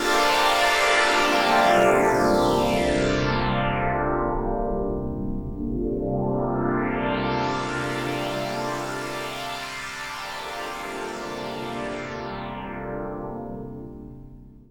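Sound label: sweep, ambient pad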